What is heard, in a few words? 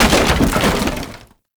Wood Fence Impact; Warframe; Ambience; Nineteen Ninety Nine